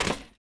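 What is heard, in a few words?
footstep Stone; droids robots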